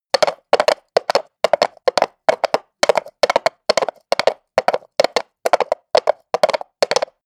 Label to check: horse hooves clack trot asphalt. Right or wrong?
Right